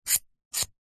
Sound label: foley metal slider small slide; zapsplat